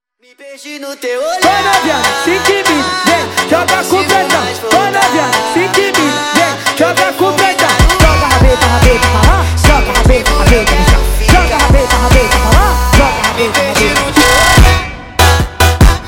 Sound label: Funk sped Up